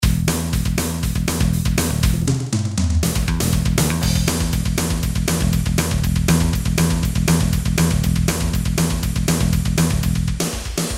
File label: heavymetal